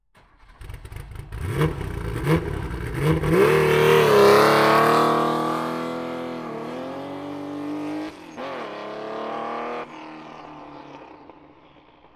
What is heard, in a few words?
porsche 936